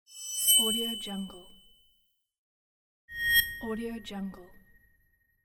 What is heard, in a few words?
the shine